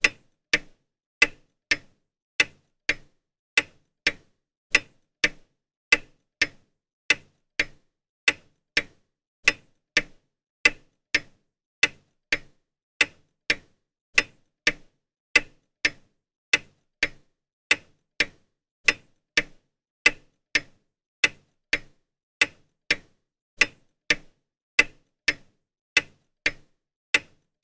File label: household clock grandmother ticking